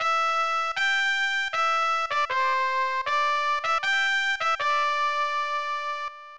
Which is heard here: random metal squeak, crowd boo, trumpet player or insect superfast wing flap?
trumpet player